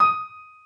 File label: soft hit whistle